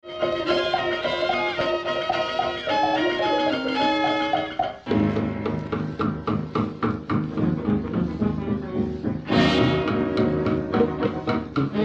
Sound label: Bells; Pell Street